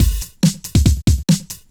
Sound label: Breakbeat 140 BPM